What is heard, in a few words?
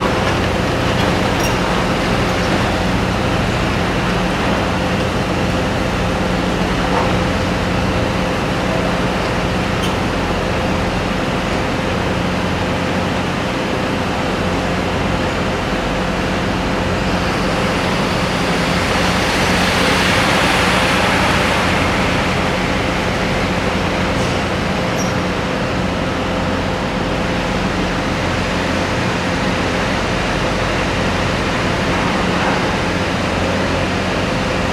ambience construction site large ground zero nyc; sound effects one